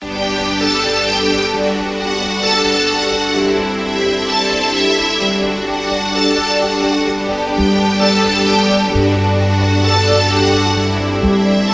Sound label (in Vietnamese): Nhạc Chuông; Chúc Mừng Năm Mới - Happy New Year Xuân Tân Sửu 2021